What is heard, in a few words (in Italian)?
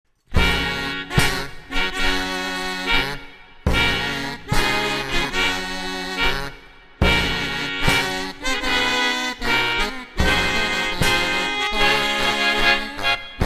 marcia nuziale